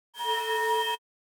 Sample Piercing Static; Pigments; GRANUALAR

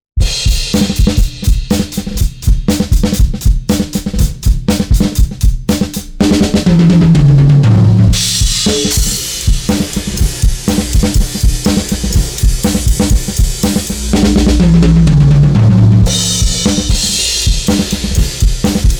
drums EQ; Titanium 3

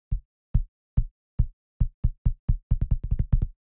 kick; Extractor

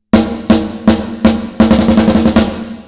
Professional Plate Snare Plate; Seventh Heaven Professional; Plate 2-03

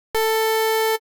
Wavetable Saw FREQ MOD Linear; Pigments

